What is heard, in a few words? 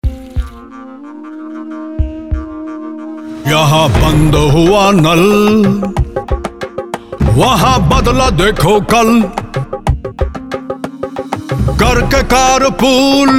Jingle; Amitabh Bachhan